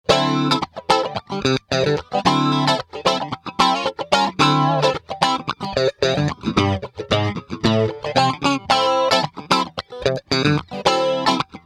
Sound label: FLANGE